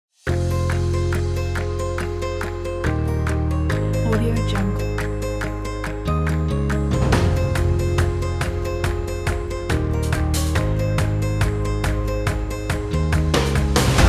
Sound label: upbeat corporate